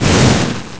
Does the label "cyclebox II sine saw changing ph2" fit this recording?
No